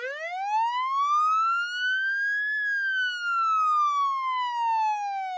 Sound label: fire alarm